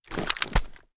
reload empty end; reloads; gmod; iw8

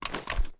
raise quick; gmod

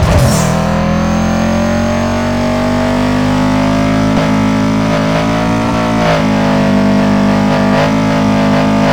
v8 first gear rev loop; vehicles; gmod

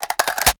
plr ar reload empty xmag magin, weapons; viper

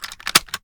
kilo98 reload scope start load; gmod; viper

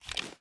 inspect

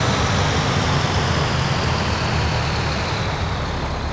throttle off fast, vehicles; phantom

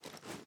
reload empty drum arm; gmod

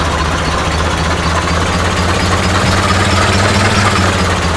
second, vehicles; gmod; tdmcars; gtav; tractor

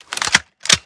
mike4 raise first, weapons; gmod; viper

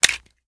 reload empty stock magout; xmag; gmod; pi mike

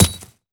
frag physics bounce grass hard; gmod; viper

